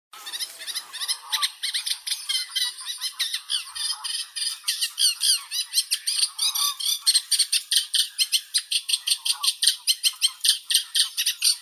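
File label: reed warbler, acrocephalus scirpaceus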